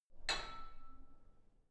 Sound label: barbell only lift up soft, fitnessstudio